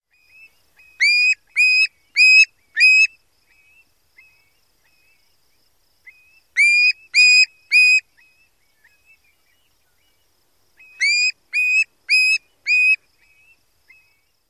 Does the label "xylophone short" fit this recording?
No